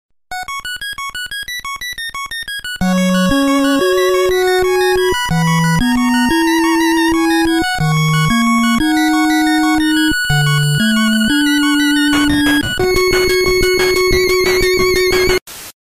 retro game

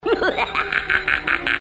cartoon laugh

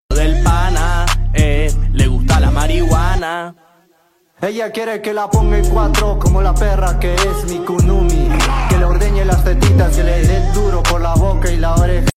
raaaaaaa

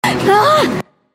ahhhhh

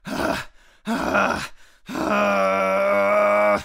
death noises; tarkov

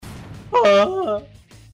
ugh

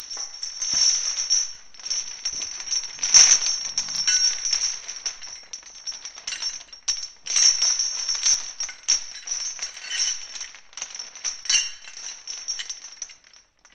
chains drag

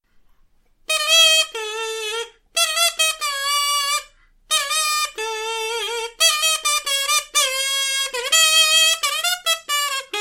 kazoo

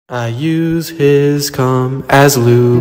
chorus

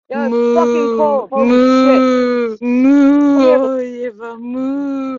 sad cow moo

